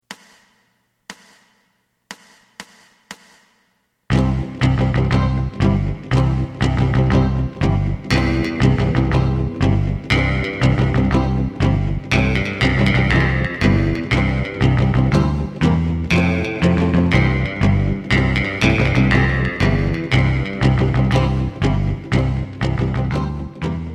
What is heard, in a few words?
drum